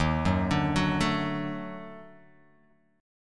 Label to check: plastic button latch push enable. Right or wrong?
Wrong